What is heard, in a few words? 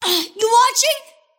cast pain; kidvoker; dota; invoker